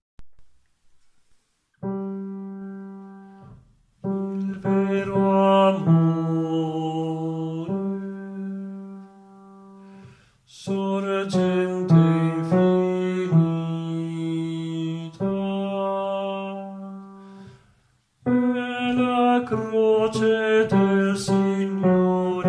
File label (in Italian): il vero amore bassi